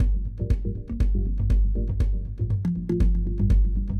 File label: Mallimban Gm7 120